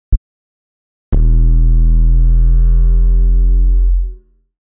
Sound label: Feedback; Ableton Live 12 Roar